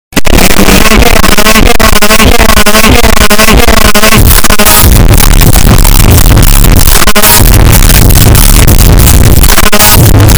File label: loud phonk; trollface